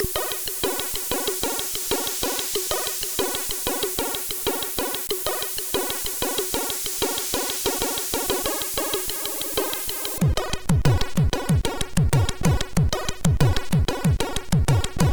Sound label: Bassliner, CSG8580R5